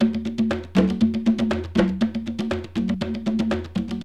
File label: JUNGL B 120, PERCUS LOOPS